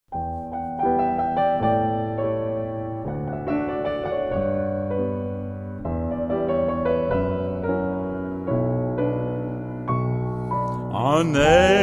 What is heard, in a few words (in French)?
TROIS NOTES TINTILLENT